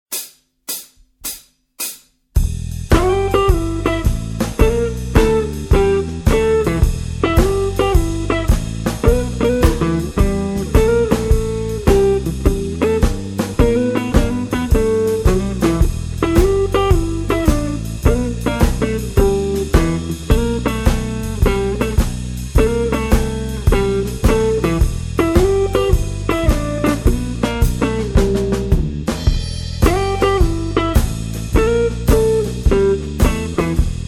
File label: blues jazz